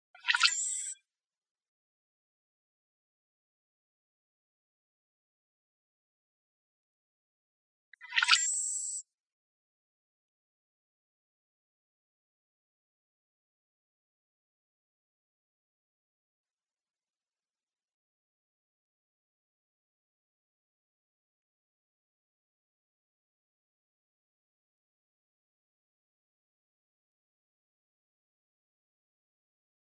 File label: Icterids; AZFO; deviche